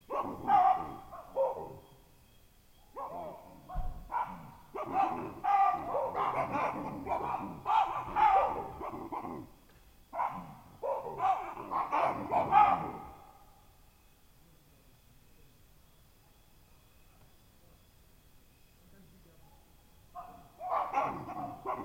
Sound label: Baboon Invasion, Marsabit Kenya